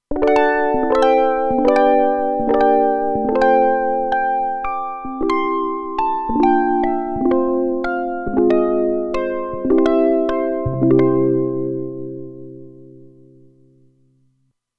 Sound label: Syn Harp, Roland Alpha Juno-2; Factory 2